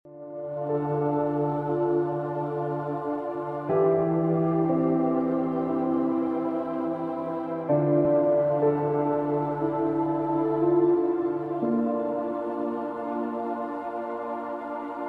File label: Dreamin Piano